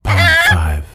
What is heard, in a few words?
chicken squawk; ba bawk